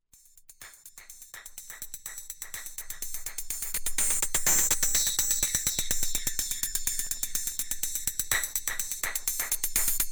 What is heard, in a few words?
Dance Jazz